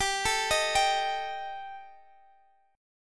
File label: G Suspended 2nd Sharp 5th G, Guitar, Open D; chordshape; down